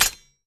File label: knife bayonet hit wall; Escape from Tarkov